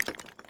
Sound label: Button General; netent